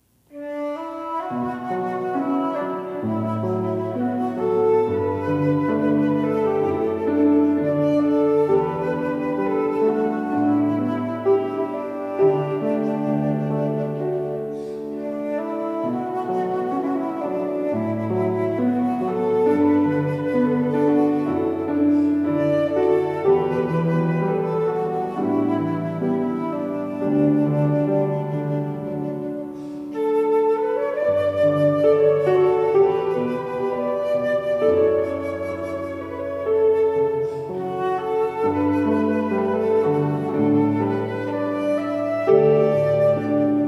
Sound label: CELTIC ENSEMBLE